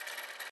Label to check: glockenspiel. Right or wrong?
Wrong